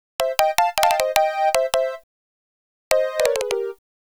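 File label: Flutes & Swingers Keys C; Zero-G Kocktail Kollection; Construction Kitz (Lounge); Flutes & Swingers 156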